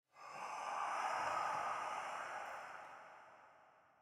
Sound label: ghost breath; pineview drive